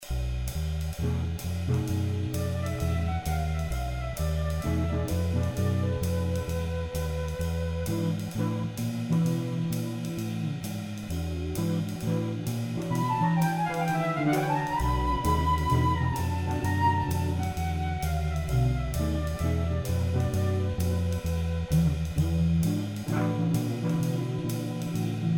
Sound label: Jazz Samploop; Pencil Racer